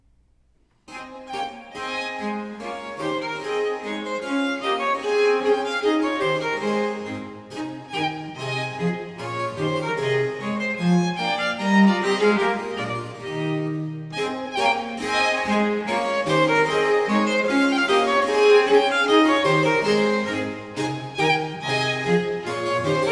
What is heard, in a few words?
Sonata in A Major Gavotte; Sonata No. 1; Haendel; Sonate a trio op. 5